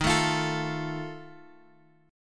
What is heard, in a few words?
D sharp Suspended 2nd Flat 5th, chord; D#sus2b5 Chord {X 6 7 X 6 5} strummed